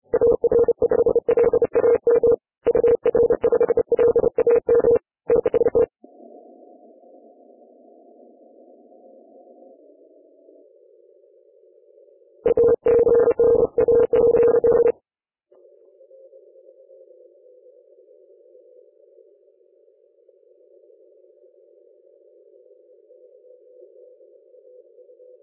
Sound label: 40M CW